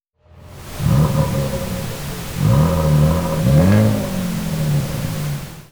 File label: Rev pink